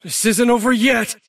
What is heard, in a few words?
move pain; davion; dota